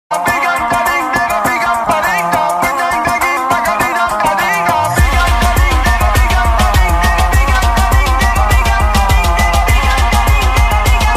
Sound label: trance electro ringtone